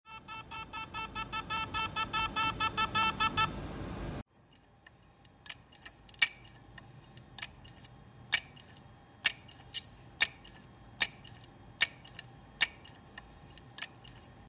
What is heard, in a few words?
hardware failure